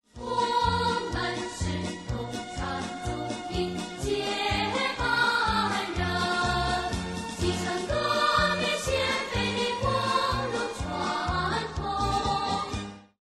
lost round; music kits custom

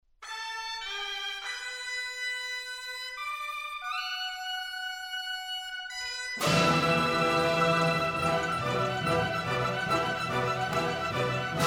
won round; music kits custom

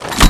Sniper Rifle Pull; Requests Studio; UT3

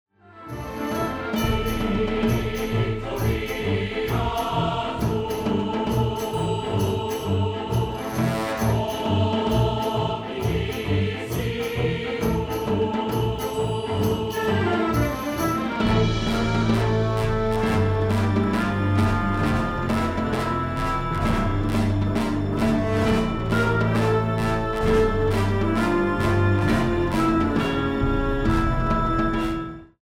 bomb planted; music kits custom

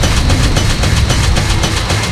Impact Hammer Idle; weapons; Requests Studio; UT3

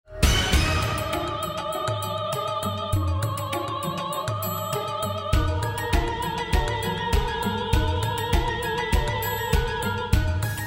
choose team; music kits custom

